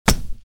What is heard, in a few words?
spear target hit